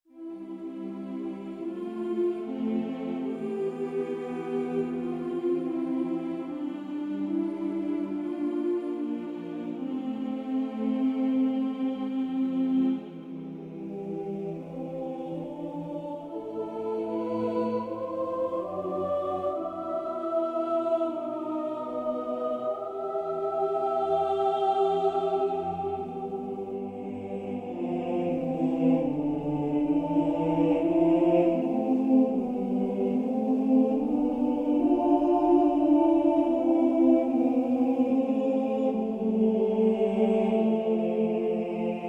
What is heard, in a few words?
choir; sonic reality; miroslav refills vol 2 choir refill; dynamics